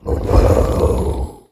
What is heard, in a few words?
pain, procoptodon; zoochosis pack